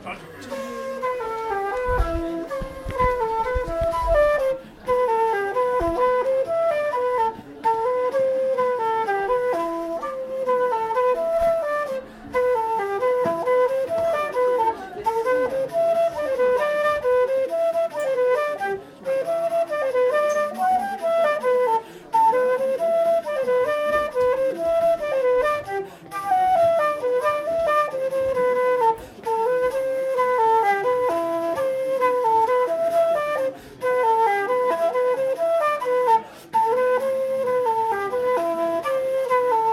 Polka

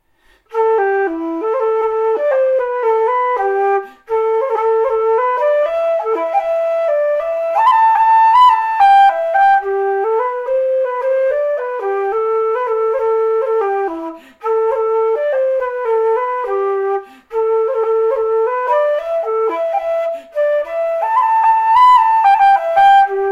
Waltz swing

